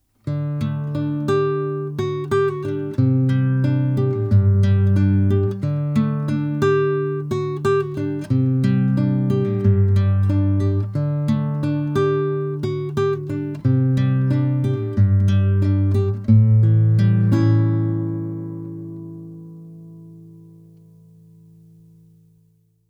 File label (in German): SPL Channel One MKIII Klassikgitarre R1 Comp EQ